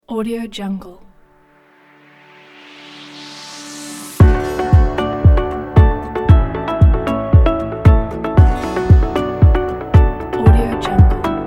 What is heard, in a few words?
innovation kit